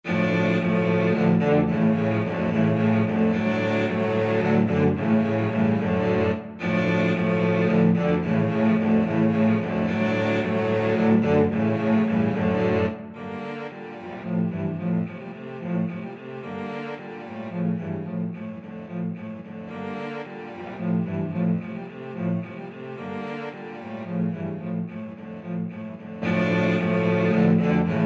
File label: cello quartet